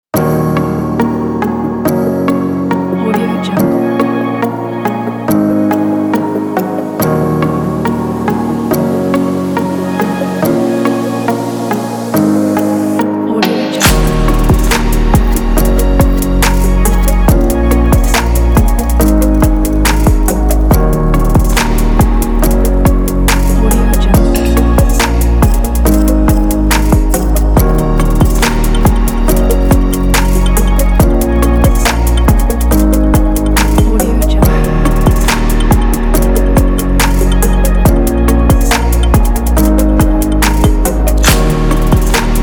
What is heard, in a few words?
technology background abstract